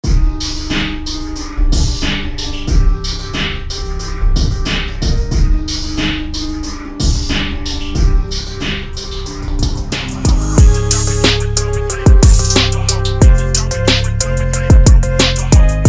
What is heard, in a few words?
phonk